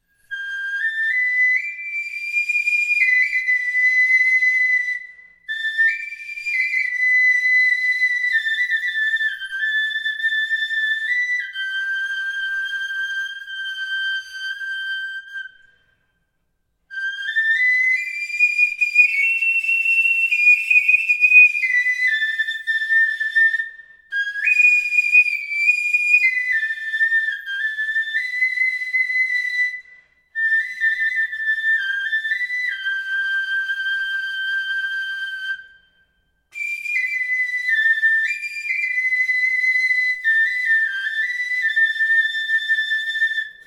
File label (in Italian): flauto osso